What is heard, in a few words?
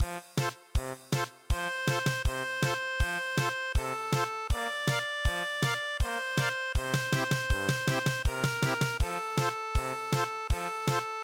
German Polka